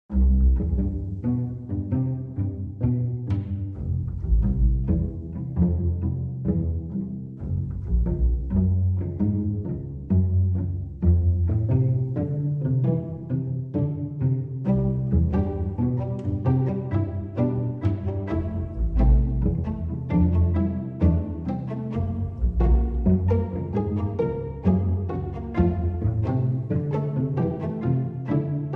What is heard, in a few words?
Chumps Promenade, Strings Only